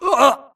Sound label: grunt death neck; Titanfall 2